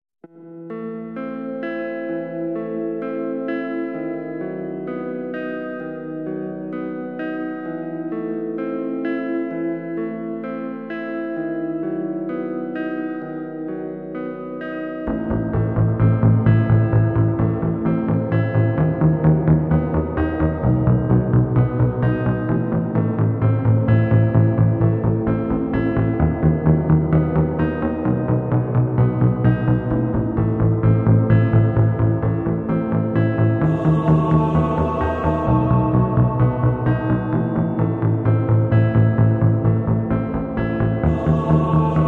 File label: slowed reverbed